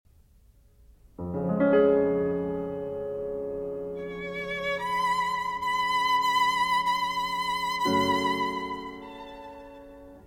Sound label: Sonata in A for Violin and Continuo